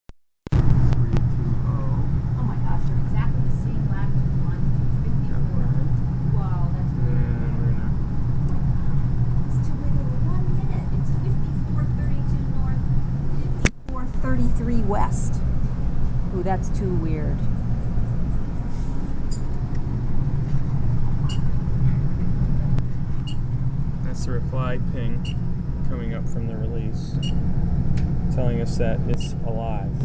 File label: Acoustic Release Test; irminger rings in the labrador sea; audio postcards